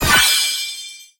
Result Drop Item Rare

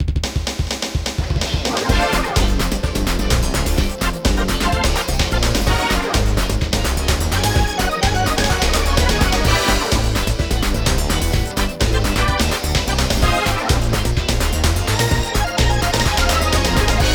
Continue; Toshinden 4; PS1

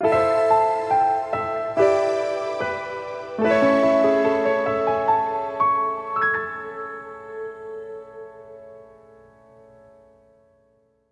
Gameover; blazblue cross tag battle

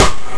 Snare rimshot (Db3); guitar rock tour

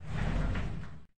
Shower Door; Lethal Company

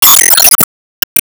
Damage Hit; Disney Star Smash; Android, iOS, Mobile

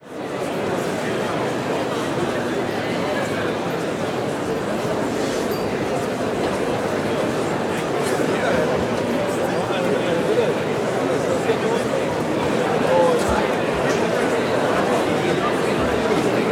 Lasagrada Murmur; Google Earth VR

